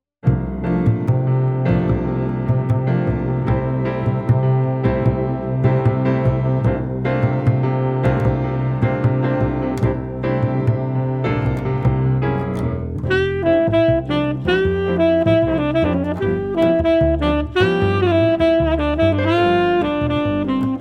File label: ensemble, jazz ensembles